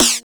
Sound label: CYBER KIT, DRUMS